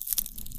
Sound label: tree sticky; nodecore-skyblock; nc tree